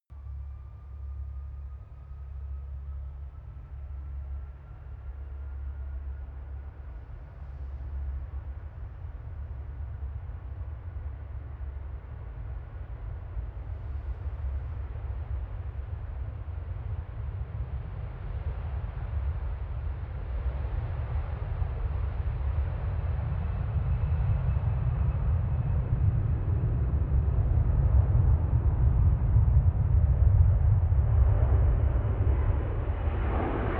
plane flyby land

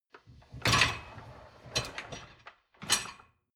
dishwasher lower tray draw out soft; maschinen; spuelmaschine

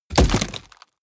body fall wood dull crunch; krieg-waffen; nahkampf; soundpool

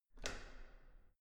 entrance door handle up hard

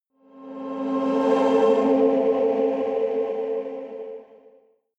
evil choir transition, risers

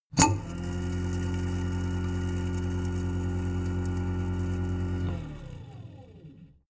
garbage disposal; haus-garten; kueche

